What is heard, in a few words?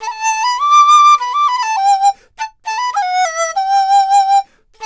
DIZU, 100; INIS - Opium; DIZU 100-G